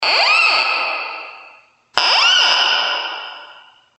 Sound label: door